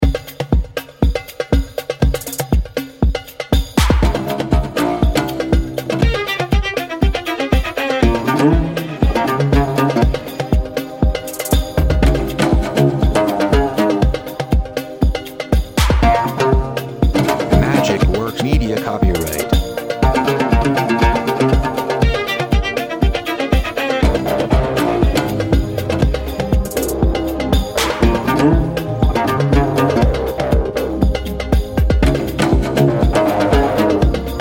Arabic Night

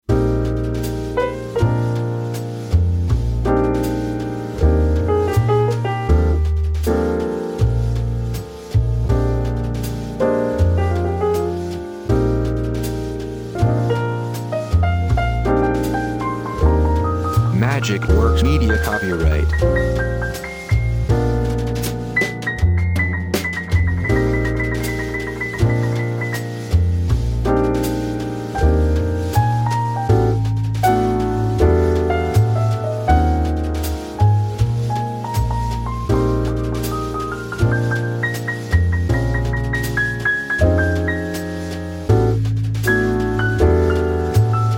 jazz

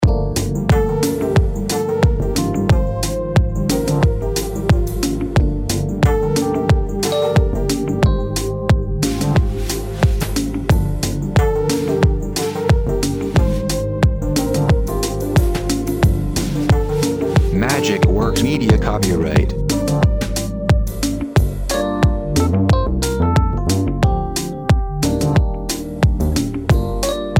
Jazz Tronik

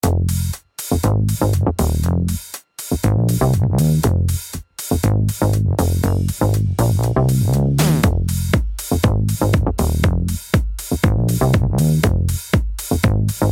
electro

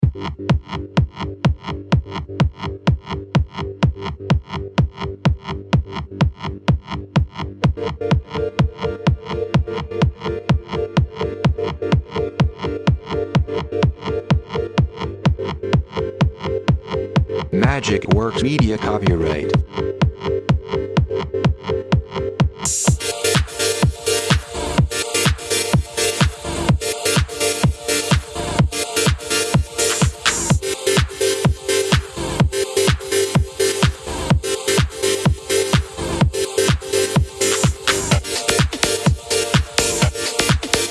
Tronik Grooves

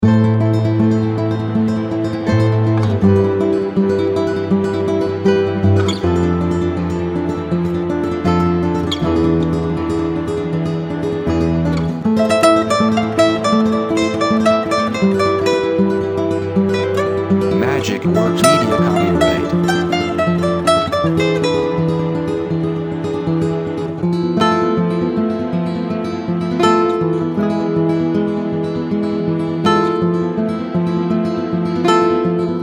spanish

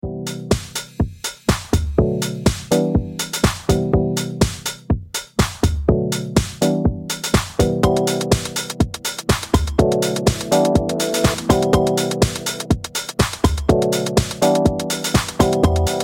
elektro steps

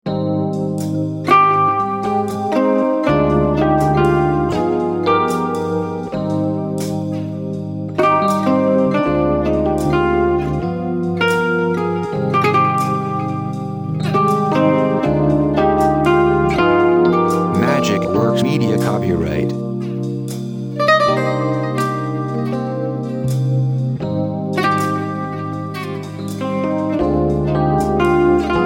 zen coffee jazz

